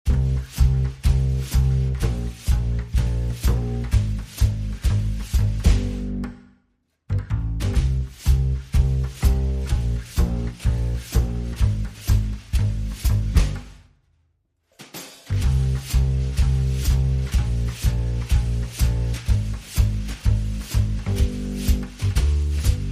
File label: brushes and bass